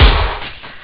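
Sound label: snare drum; ST-XX Amiga Soundtracker Sample Packs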